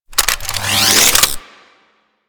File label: Chain Lightning Rifle Reload B; Crp Chain Lightning Rifle